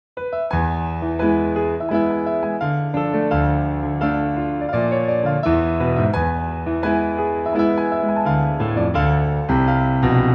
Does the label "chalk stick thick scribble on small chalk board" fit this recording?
No